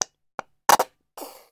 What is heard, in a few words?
food mayonnaise bottle empty squirt; sound effects one